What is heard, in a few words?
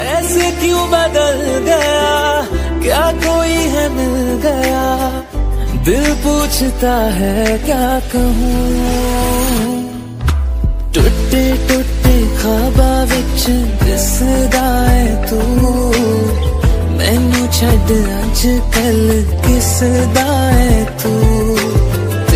romantic ringtone, bollywood ringtones